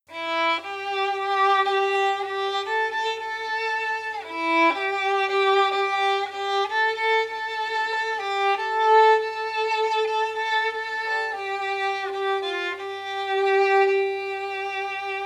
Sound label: violin solo